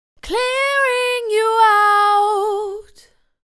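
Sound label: 120 Fm, dance vocals; clearing you out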